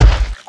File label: step, footsteps; pudge